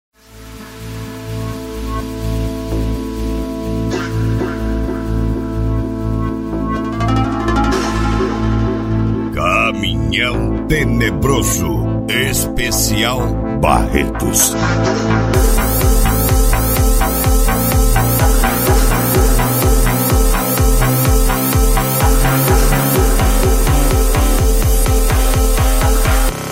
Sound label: violins; cd caminhao tenebroso esp barretos 2023